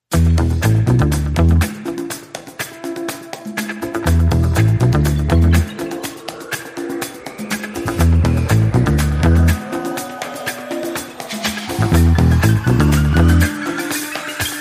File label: No Hammond Organ